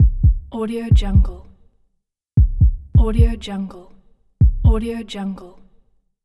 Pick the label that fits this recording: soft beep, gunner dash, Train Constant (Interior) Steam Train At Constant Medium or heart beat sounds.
heart beat sounds